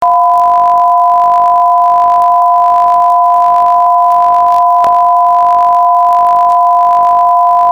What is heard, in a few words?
PCM-X2 playback test, test tones